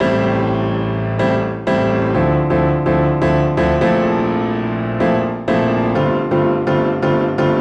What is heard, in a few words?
Poly Piano 126 Am